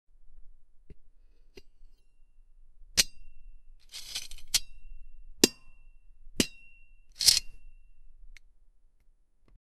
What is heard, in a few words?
the sound of a metal, industry